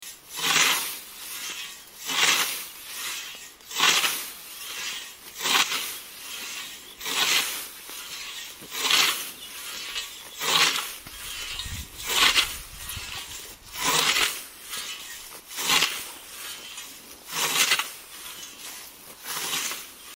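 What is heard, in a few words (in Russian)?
kosa, scythe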